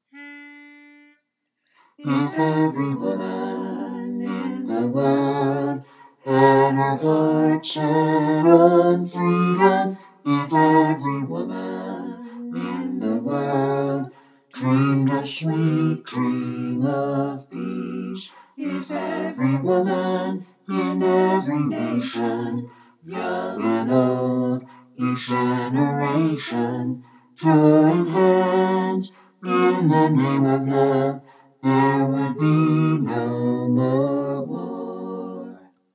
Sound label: Bass, choir